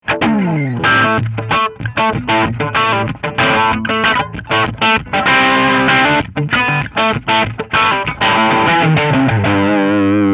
Triode Crunch

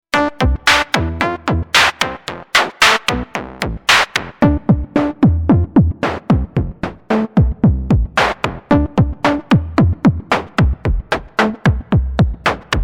Beat Sequence; Xenophone

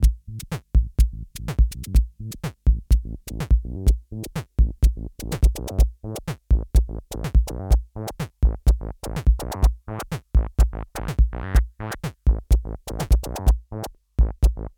Mix Bus EQ Solid State; Gyraf Audio G14-S

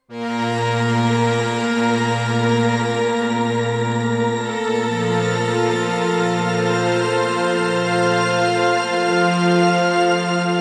AMS dmx 15-80S Shimmer

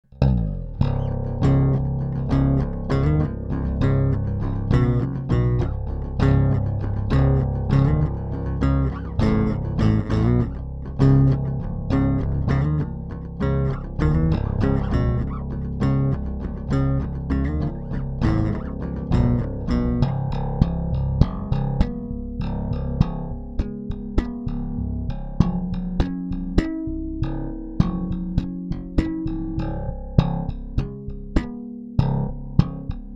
low

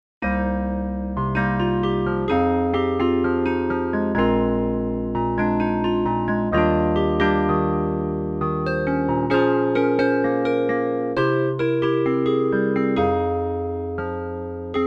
Roland MKS-10 Planet-P e-piano chorus

